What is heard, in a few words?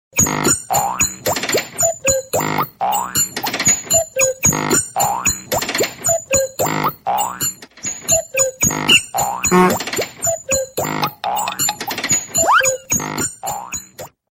Funny Machine